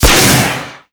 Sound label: 57mm rocket fire; Modern Warfare